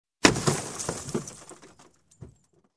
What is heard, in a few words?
Window pane destruction